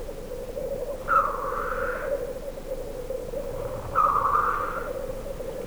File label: lyrurus tetrix